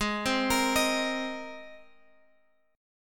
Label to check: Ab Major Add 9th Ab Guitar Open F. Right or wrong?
Right